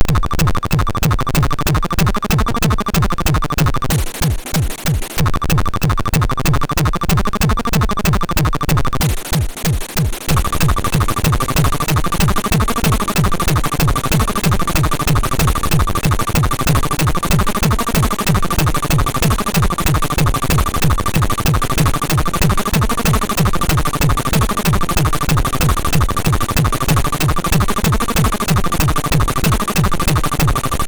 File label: sid, MOS6581R2; Grasbrenner Tune; Cosowi